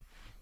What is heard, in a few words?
Clothes